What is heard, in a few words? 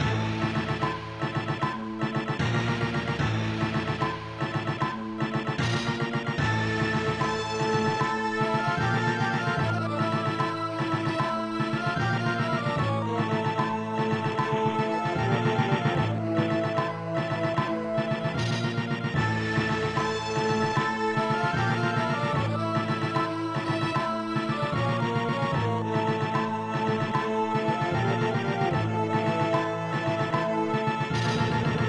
Ending loop; Vasara Collection; PS Vita